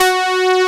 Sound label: OBX Saws F#3; Digital Sound Factory Xtreme Lead